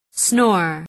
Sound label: snore